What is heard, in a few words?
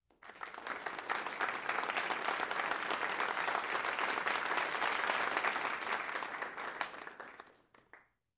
Crowd Small Med Qu, small int